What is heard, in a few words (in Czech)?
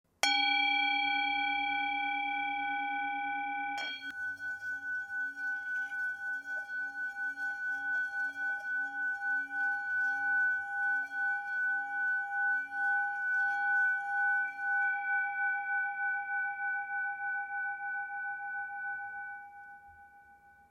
tibetska misa; Nagpa; palička na hraní; Obrácený zvon, meditační mísa, léčivá mísa, himalájská zpívající mísa, tibetská mísa